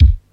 00s sharp kick sound d key, kick drum one shot tuned to the D note